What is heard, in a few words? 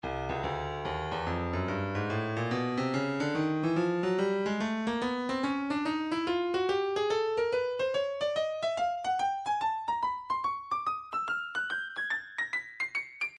breakbeat 072 bpm; Slow Jammies B; Stylus Breakbeat Midi Files